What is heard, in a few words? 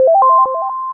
sfx found item; Arcane Quest; Android